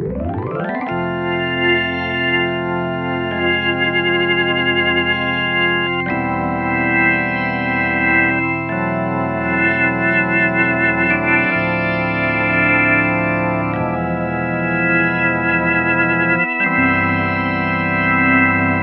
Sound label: organ wt, waldorf blofeld